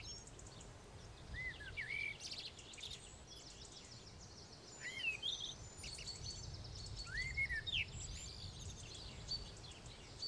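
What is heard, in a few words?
Scottish farm veranda; the 39 steps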